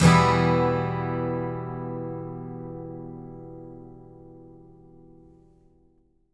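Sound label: Acoustic guitar E minor down, Country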